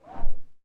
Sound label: wraith Sound; hexhollow